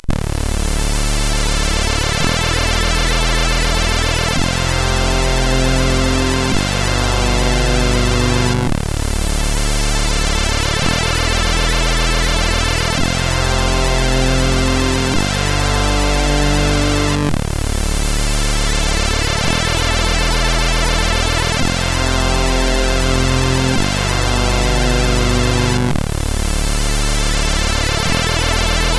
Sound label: Snare CSG8580R5